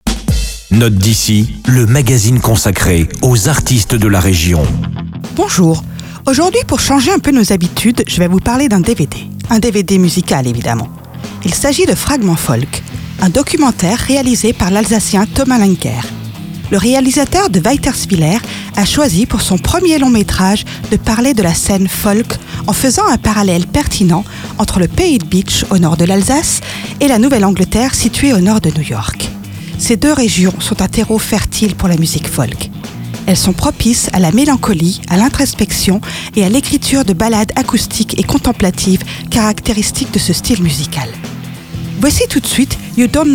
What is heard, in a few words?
fragment folk; Note d'ici